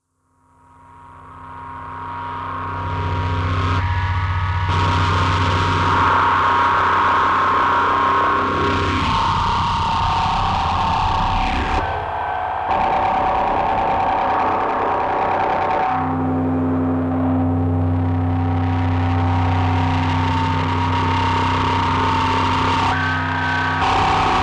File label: Interference Field Transmission